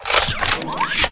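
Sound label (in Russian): запуск лазерной установки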